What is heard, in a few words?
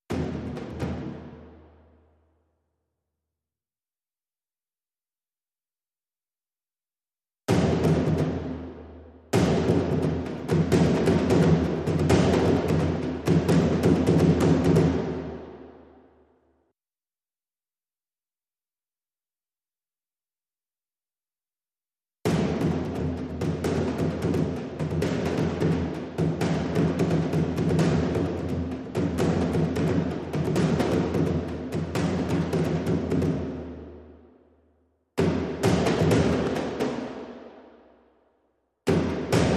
Dhol Stick